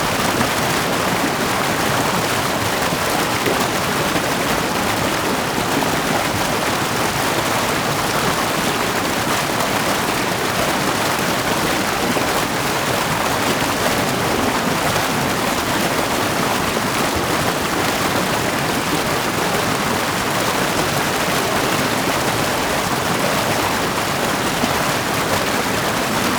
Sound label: River, Static Microphone